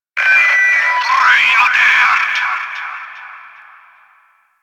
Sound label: Fortress Interior Notification; Ambience; Warframe; Grineer Fortress